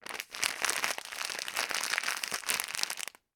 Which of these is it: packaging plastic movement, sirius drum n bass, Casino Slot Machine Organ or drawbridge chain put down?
packaging plastic movement